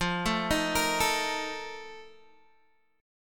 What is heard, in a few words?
F 11th F, down, Guitar DADGAD